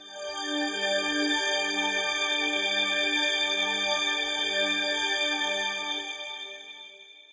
bell choir, strings n pads; lmms

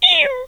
italic off; tuxpaint

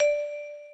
dong; lmms

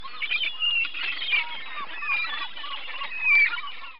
harbor; watercycle; gcompris-qt

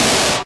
nasty rim; lmms; drums

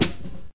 hihat foot pedal; lmms; drums

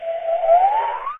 distortion; tuxpaint; magic

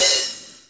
drums; fofix

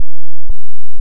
sine dist; lmms

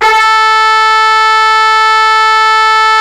trumpet; lmms

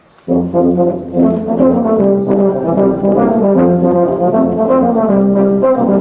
tuba; gcompris-qt

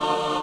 rave choir, strings n pads; lmms

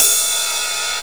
ride, drums; lmms

